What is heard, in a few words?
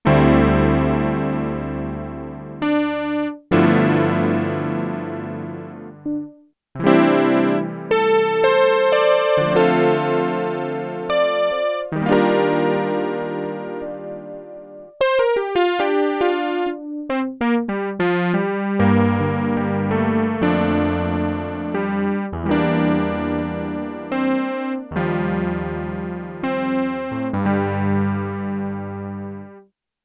ELECTRONIC GRAND, Korg DW-8000; Factory Patches